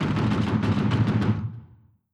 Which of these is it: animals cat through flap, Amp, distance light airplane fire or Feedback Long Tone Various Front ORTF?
distance light airplane fire